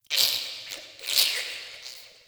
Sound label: Interior Squish Gurgle, Infested, Ambience; Misc Indoor